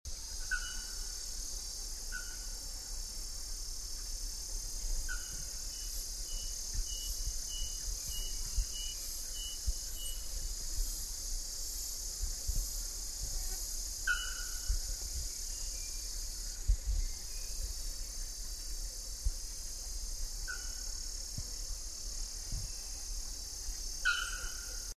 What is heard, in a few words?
Procnias nudicollis